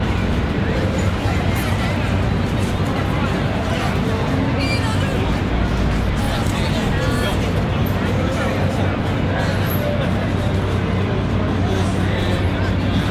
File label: Times Square New York